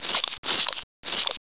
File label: raptor chew chunk